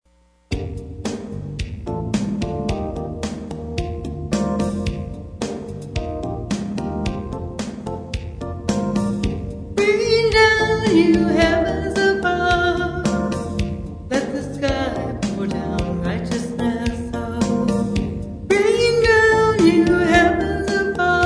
Rain Down, Rain down, you heavens above, Let the skies pour down righteousness.